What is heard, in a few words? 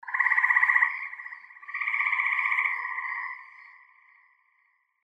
frogs